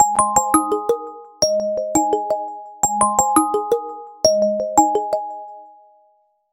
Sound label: nokia barbell; hmd